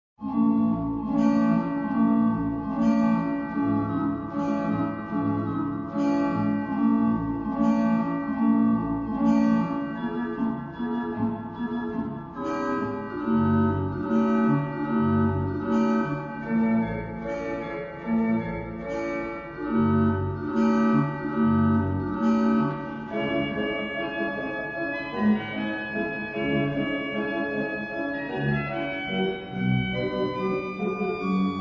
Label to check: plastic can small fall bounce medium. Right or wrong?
Wrong